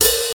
vintage damped fusion open hat a sharp key, open hat sound tuned to the A# note